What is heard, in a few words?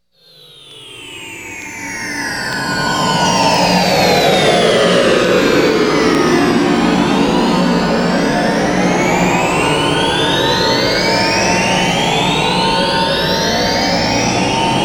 Flying Saucer; Halloween Sound Effects